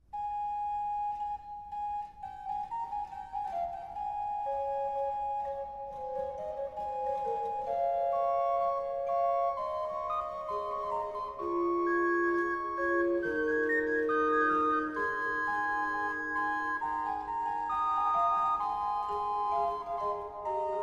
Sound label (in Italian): Canzona Francese; Palestrina-deMacque